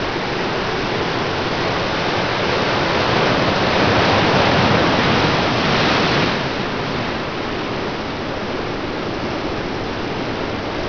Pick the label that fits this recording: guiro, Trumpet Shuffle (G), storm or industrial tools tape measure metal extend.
storm